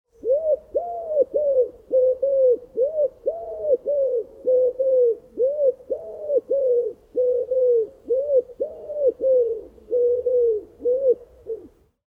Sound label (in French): pigeon ramier chant